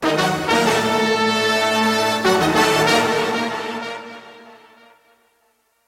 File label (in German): AUDIOLOGO